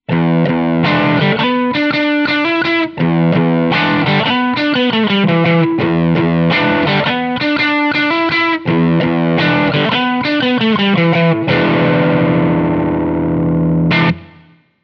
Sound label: crunch bridge parallel, drive – bridge-PU in parallel; Manne Ventura; Manne Guitars’ Ventura Satin